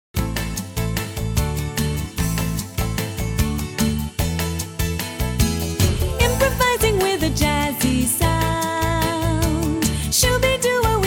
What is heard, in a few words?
Five Four Groove